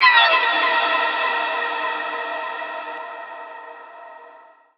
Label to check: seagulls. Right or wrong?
Right